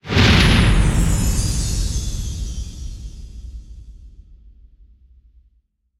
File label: big win start; hiddencoinsofegypt